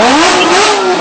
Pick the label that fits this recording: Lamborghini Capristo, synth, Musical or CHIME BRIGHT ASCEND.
Lamborghini Capristo